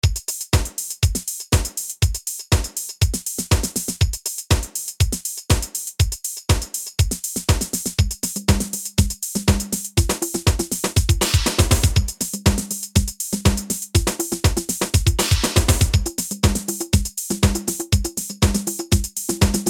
Old School House Beat

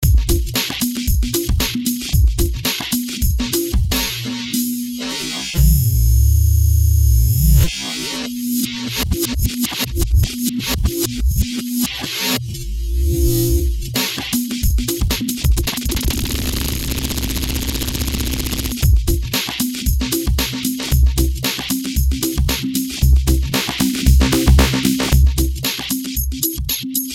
Drumloop speed scale; IL Harmor

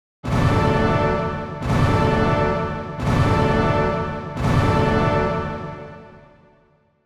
Hit weteq

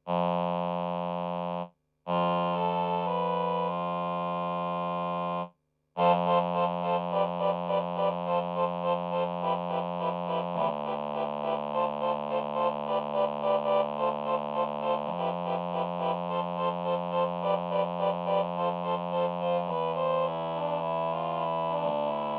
voices male

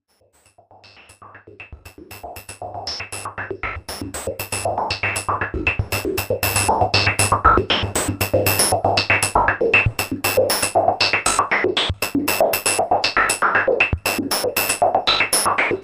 sh5 ringmod noise

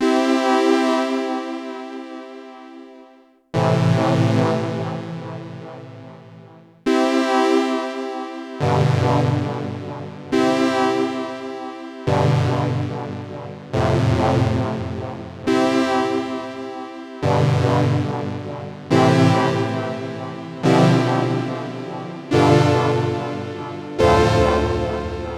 Modal Argon8

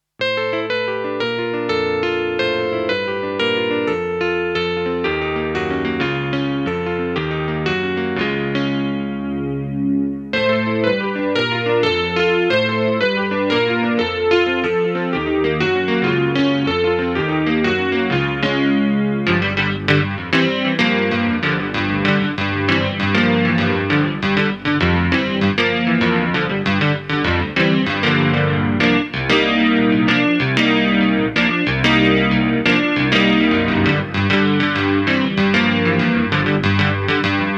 Yamaha SPX90 Pitch C E Grand

